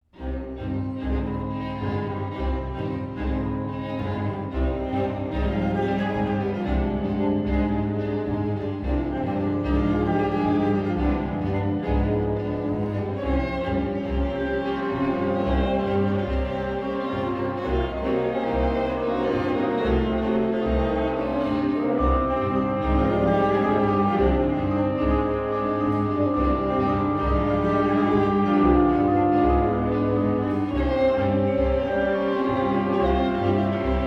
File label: Tango; movie and stage